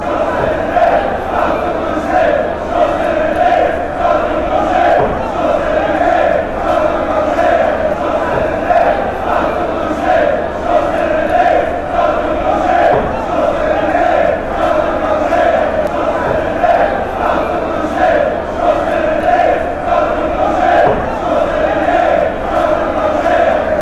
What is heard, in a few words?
beerschot chant